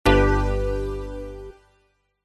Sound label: multimedia game sound bold warm 70s retro synth complete